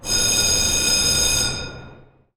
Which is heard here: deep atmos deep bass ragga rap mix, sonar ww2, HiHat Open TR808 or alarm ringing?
alarm ringing